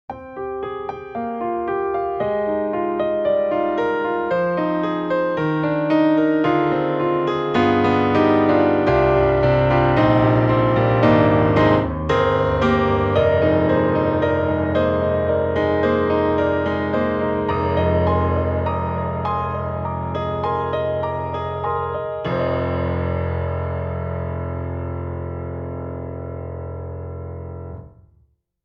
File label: Rosewood Grand Intimate Piano; Orange Tree Samples; Evolution Rosewood Grand